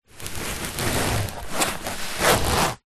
sport exercise mat foam thin shift slide scrape movement on carpet